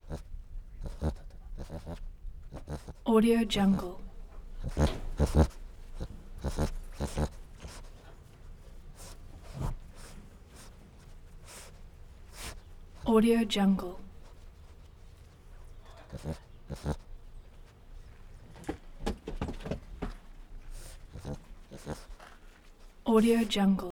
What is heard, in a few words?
otter happy grunting various versions; prosoundfx